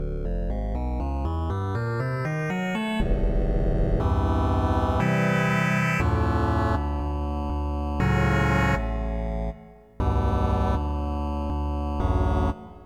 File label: Dry Feedback